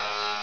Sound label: buzzer; assault